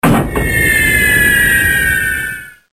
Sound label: bomb